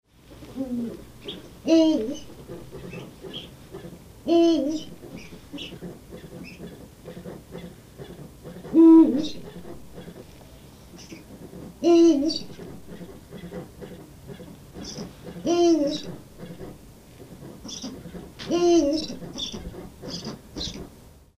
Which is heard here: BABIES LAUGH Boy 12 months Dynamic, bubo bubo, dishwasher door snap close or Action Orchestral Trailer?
bubo bubo